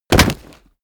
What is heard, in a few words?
body fall concrete leather rumble; nahkampf; soundpool